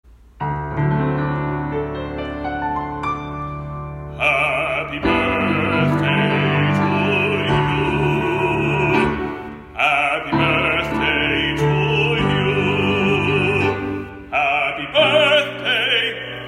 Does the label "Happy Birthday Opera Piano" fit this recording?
Yes